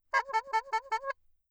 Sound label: choked horn, bicycle horn, Anechoic; OPENAIR